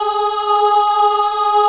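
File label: G#3 Choir "ah", Right; CHILD; USB Soundscan vol.28; Acoustic & Synth; AKAI; CHILD AHF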